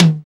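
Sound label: RX TOM HI, machine toms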